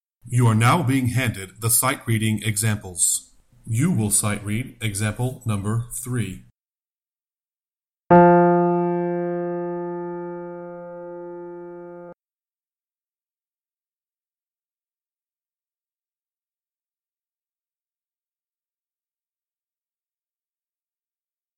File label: Sightreading Example Tenor